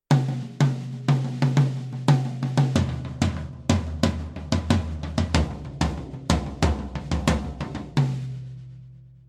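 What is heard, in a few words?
excerpt from a soundcheck toms drum roll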